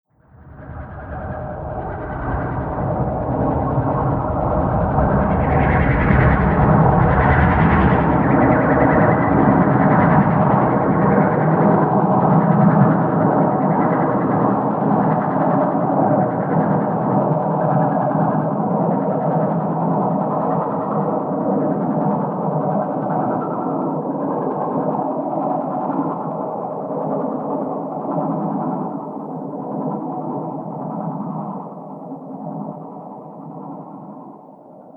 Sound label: Drone Undulating The Undead, drone sound effects